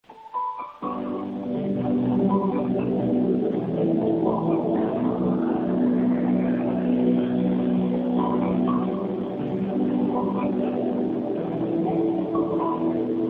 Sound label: music; キャリア別通話音質